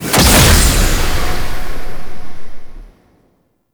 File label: Oxygen Pillar Activate; Warframe; Survival